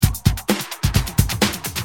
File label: Tight, Drums Perc; Industrial